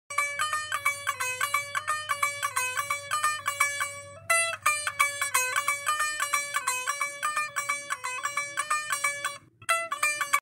Ektara

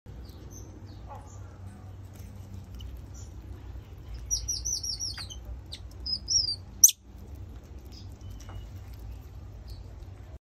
Tamarin

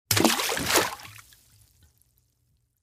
Falling fruit in water

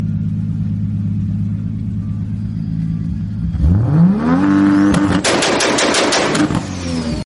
Skyline GTR Backfire

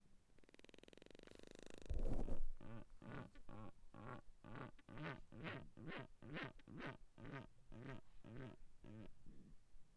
Albatrosses; پرنده آلباتروس

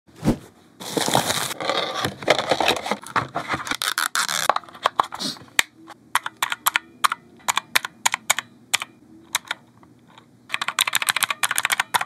calculator; ماشین حساب

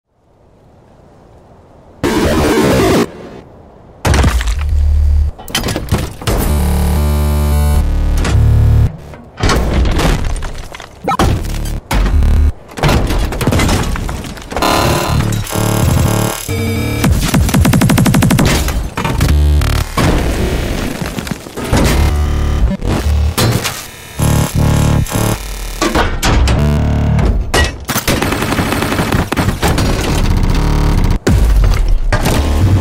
Cyborg

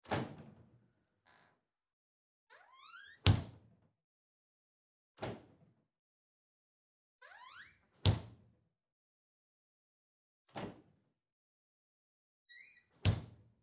opening closing door freezer

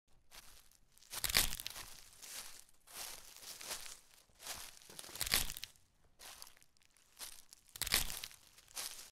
Walking on dry leaves

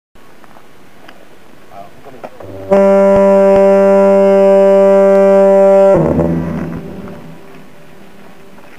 Lighthouse; فانوس دریایی

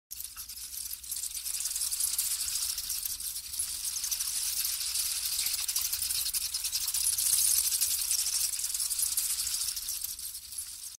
slow loris; چشم گرد تنبل

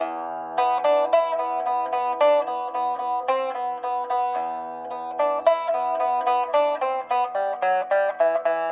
Bm Melo Pipa Lute

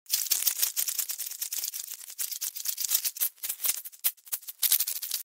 crawling

